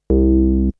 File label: Synth; ZERO G - Datafile DISC 3